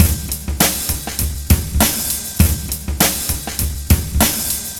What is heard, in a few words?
groovy march, 100bpm